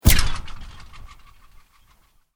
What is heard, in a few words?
Sweep Mechanical Fast